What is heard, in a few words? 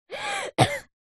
human boy aged 9 cough